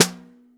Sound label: SN1 Bottom, snare; Ableton Foundation FreePacks; Snare Designer